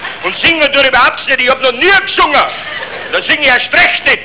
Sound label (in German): singen